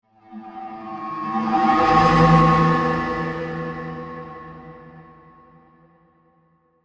Digital Gong Reverse, Reverb; BlastWave

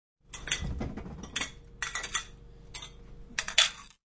Stacking Dishes, Sound of dishes being stacked; Kitchen Sound Effects; Sound Effects:Household

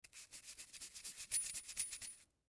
Potato Wipe Clean Peeler In Hand; Food; Household; Blastwave; Foley

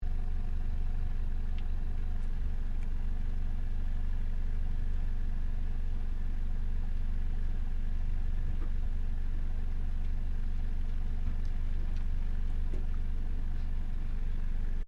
Idle Exhaust Loop, car; Hyundai Accent 2008; Hyundai hire car; Vehicles

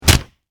Impact Punch Face Hard; Punches and Hits; Combat

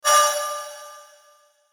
METALLIC FAST, Metallic Fast Attack; Metallic Fast Attack Scrape Chime; Metallic Sound; Metallic Sound Sound Effects; Production Element Imaging Element Accent Transition

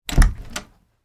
Door Open French, Foley, Door, Open, French, Door

